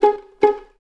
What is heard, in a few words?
VS G#4 Pizz, String Ensembles, Violins; Miroslav Vitous; 11 Violins